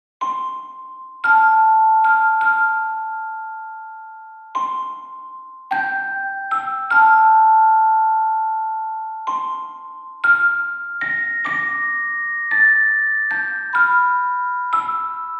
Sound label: wedding march bells; wagners wedding march bells